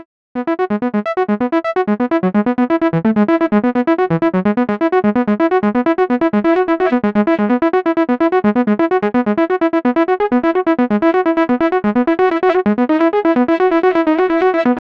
pentatonic arpeggio synth; atmospheric

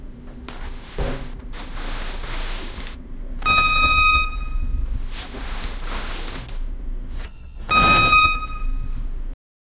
hospital beep aound huggi, fabric

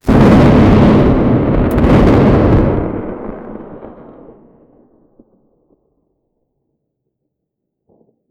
magical lightning bolt

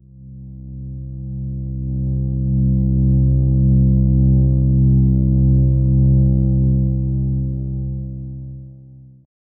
a low synthesizer drone

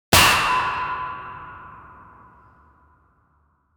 cracking concrete; collision

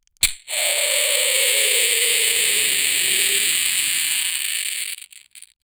a small rocket toy is, crushing

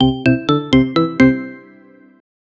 a cheerful 3 note jingle; SFX Engine

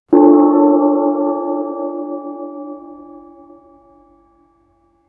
a tibetan gong hitting be

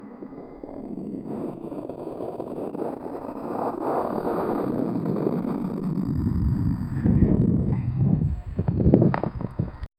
rolling snow ball

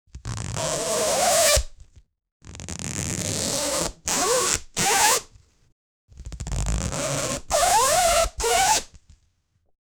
rapid rough rope friction; natural

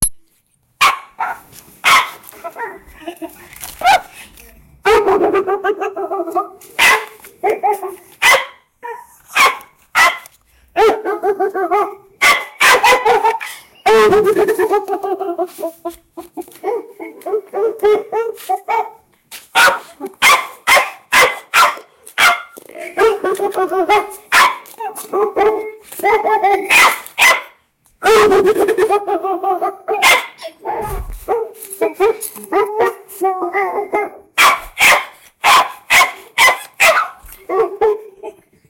a 18 year old young, backyard

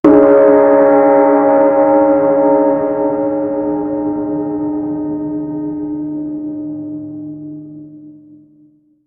a gong sound, circus; to start

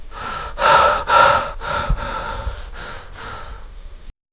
faint breaths rescuers

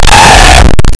goblin guttural growl

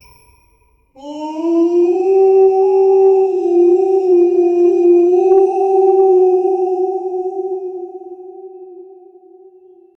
a majestic deep and incre, mythical

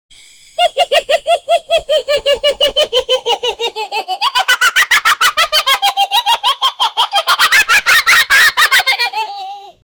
gummy ball rolling with

